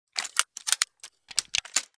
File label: uzi stock unfold